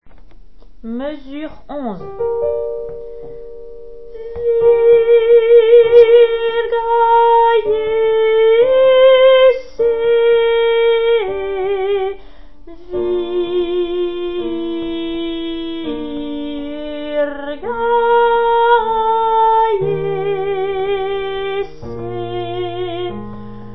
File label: alto